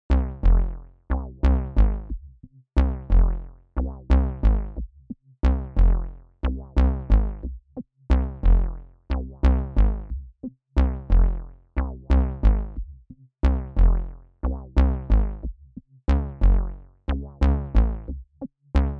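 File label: Night Arp, dry wet; SYN 99 Frankfurt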